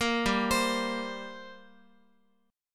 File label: G Minor Double Flat 5th, G, Guitar; arpeggiated; JGuitar